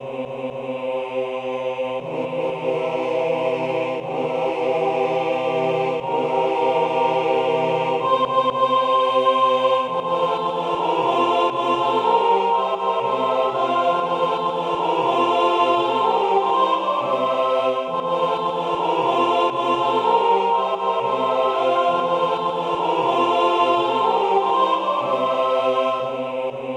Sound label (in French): 4 voix mixtes, chorale; harmonia; hymne de la chorale harmonia